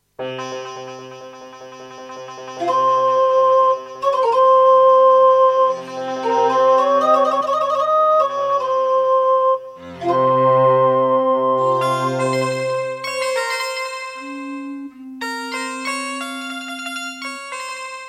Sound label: Intro, Roland SCB-55; Prince of Persia 2 - Intro